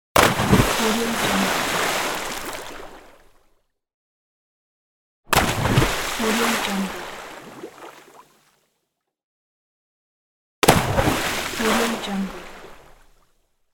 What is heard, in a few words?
water splash